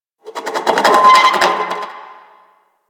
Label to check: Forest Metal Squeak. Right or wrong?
Right